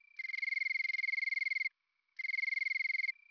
pellucens

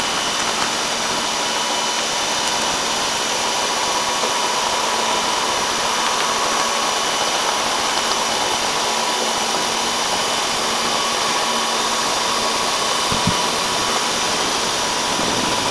Orca sound Night Passby

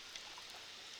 Background Noise Clips

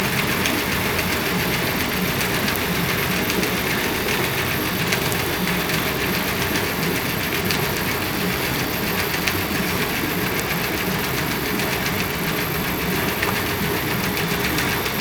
Hydrophones, orcasound

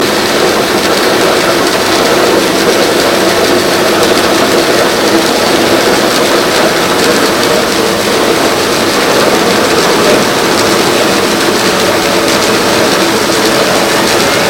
Hydrophones, orcasound

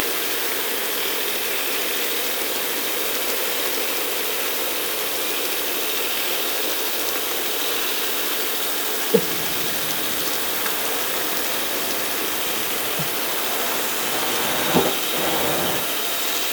Orca sound Night Passby

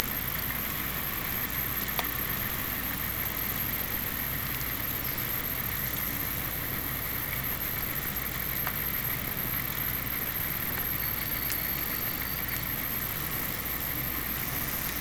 Hydrophones, orcasound

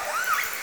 call, SeaSounds; possible S41

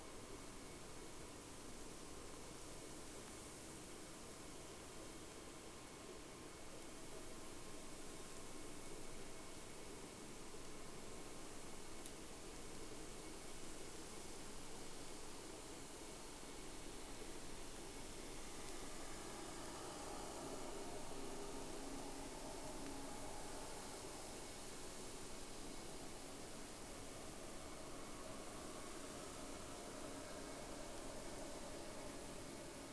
sound, Boat; SeaSnake

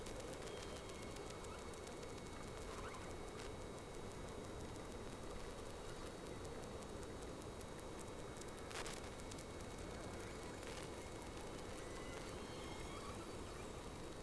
Whales; SeaSnake

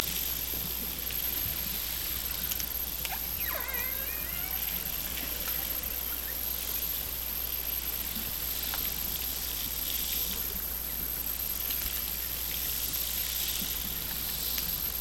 Hydrophones, orcasound

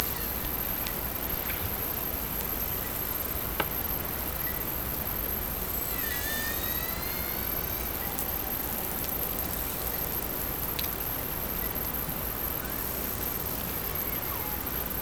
Hydrophones, orcasound

